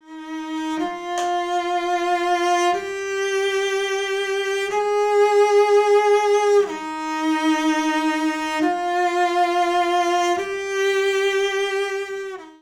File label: Off Axis